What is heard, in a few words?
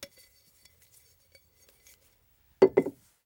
Ceramic Vase Handles Down; Fairlight Sound Lib